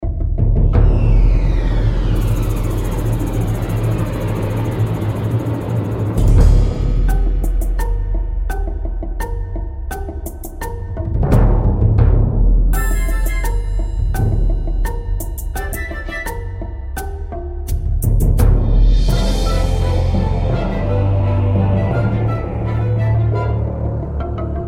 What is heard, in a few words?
combat music stage 2; Might and Mayhem